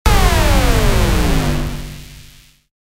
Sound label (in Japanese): ビーム砲